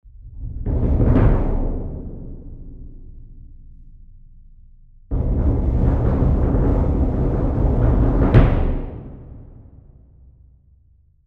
Concert Bassdrums

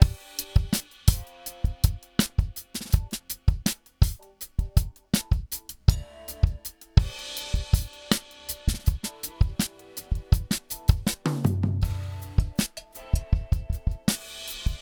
Chandler Limited Zener Bender Hardware DRUMS; Softube

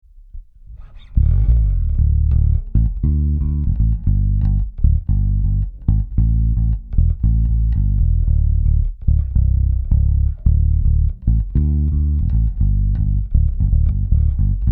aer amp one active j v finger

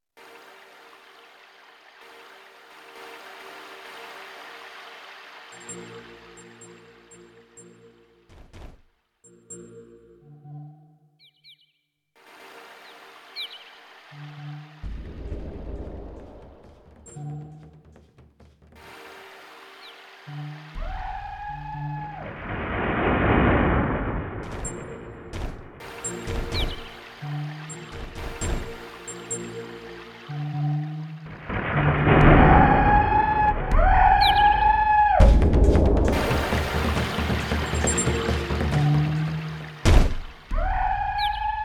ROLAND SPD-20 PRO; Kit 14

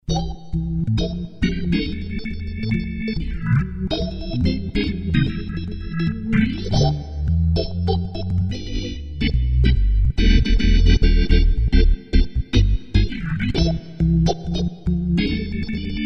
Crumar Mojo Classic Bubble Squabble; Max Tempia

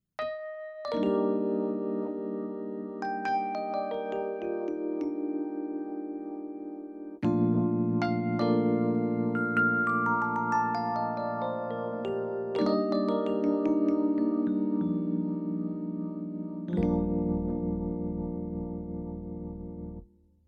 Tremolo EPiano

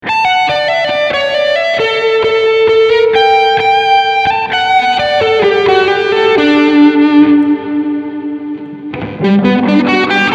AMP Hall Overdrive

